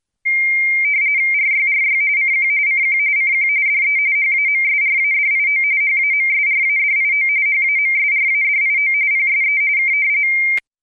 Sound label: RTTY; 455 BAUD; ham